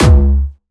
D2, Jumpstyle Kick; vengeance essential club sounds-3; VEC3 Bassdrums

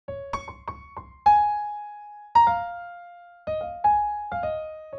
Piano Fill in 96 bpm

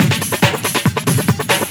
Breakbeat 140 BPM

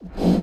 BD Gate FX, Bassdrums; Vengeance Samples

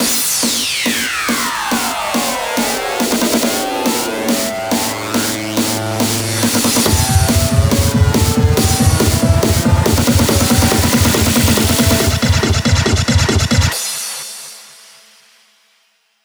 140BPM 8Bars, HandsUp Trance Fills; Mayhem; Vengeance Samples

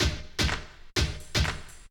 drm 125, drumloops; PS-28 Vocal House Samples; frontofhouse

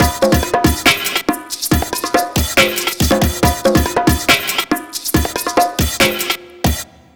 tribal drums, Dubstep; Dubstep Sample Pack

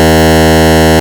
Arcade Riffs

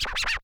Cuts, Vinyl Record Samples; Cuts Medium

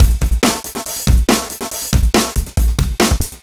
Breakbeat 140 BPM

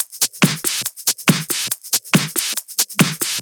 Loop 140 BPM; vengeance essential club sounds-3

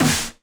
Clap; Vengeance Samples; VEC1 Claps

